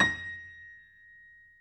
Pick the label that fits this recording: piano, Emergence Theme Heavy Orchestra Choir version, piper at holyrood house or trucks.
piano